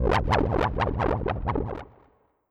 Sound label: poison magic